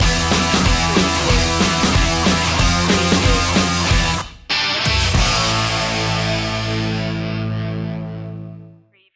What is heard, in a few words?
fuzzbomb stinger